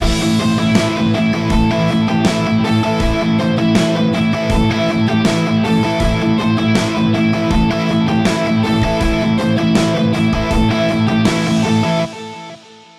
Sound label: synthetic